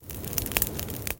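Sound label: stove crackling fire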